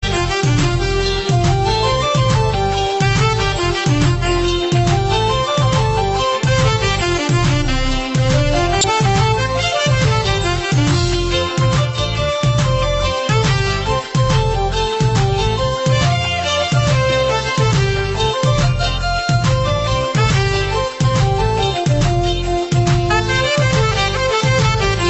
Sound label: eritrean classic